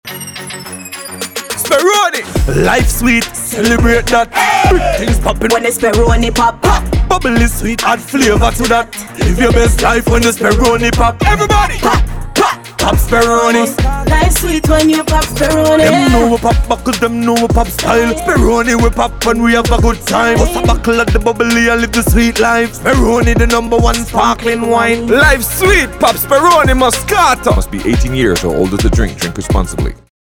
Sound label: Jingle With Tag; Sperone